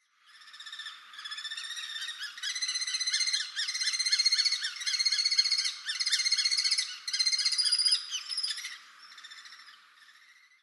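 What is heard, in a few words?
Common Kestrel; utsunomiya; koe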